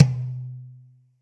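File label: TOM loud; Zero-G; Chemical Beats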